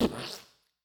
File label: Vocal Splat